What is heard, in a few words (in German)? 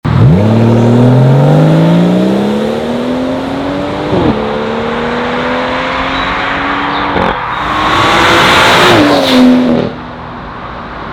Fahrsound M760li Stage3; BMW M760li G12 mit 880 PS / 1200 Nm